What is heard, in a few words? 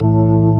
B3 FULL; E-MU Formula 4000 Series Vol. 3; Analog Odyssey